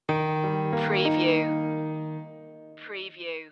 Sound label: melancholic flute stinger